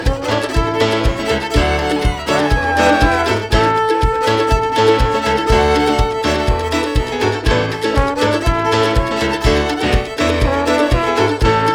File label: trombone c